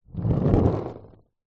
skateboard plastic short roll on street; sport

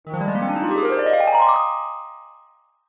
cartoon rise climb ascend magical; zapsplat

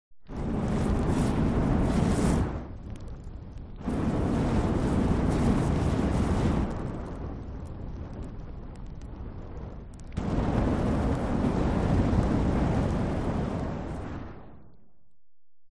FLAMETHROWER Long Multi; WEAPONS

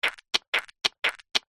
cartoon baby suck dummy

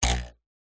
cartoon bounce jump ruler twang

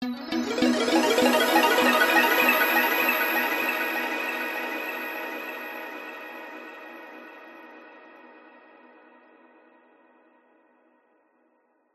sound design magical rising twinkling nostalgic

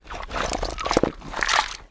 large rock lift movement beach rockpool; foley

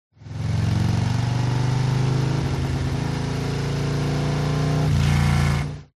TRANSPORTATION MOTORCYCLE Harley Accelerate Short; smartsound

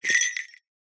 foley jester hat bells set down carpet; zapsplat

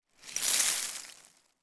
foley debris grit gravel pour fall

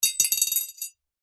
household ring drop into glass jar

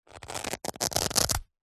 clothing bum bag belt velcro rip; zapsplat

